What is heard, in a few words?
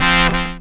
SYN CHD; E-Lab; X Static Goldmine 2; akai